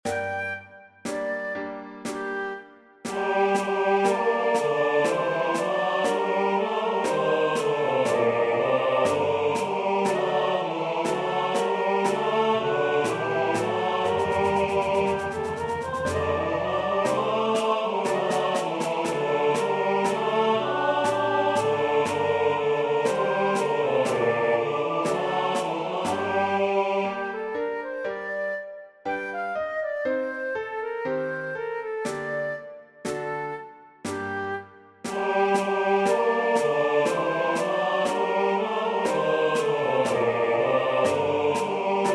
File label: Bass; Patapan; Christmas 2025